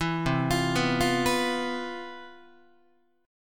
C Major 11th C, Guitar 4A,4E,5A,5D,5G sharp,6C sharp; CM11 arpeggiated; JGuitar